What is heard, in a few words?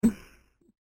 GIGGLE